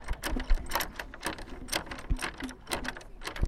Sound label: door handle